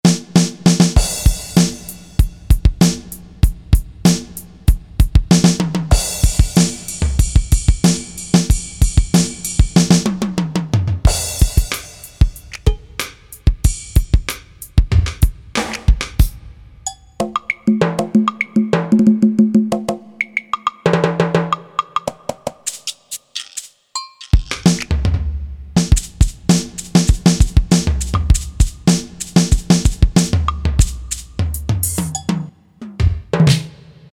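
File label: drum rock kit; E-mu Proteus FX; Factory Presets; RokKit2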